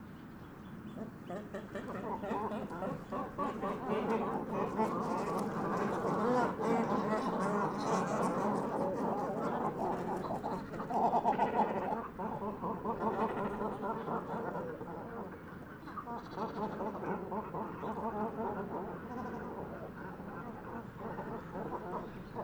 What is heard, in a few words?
Great Cormorant Arriving At Roost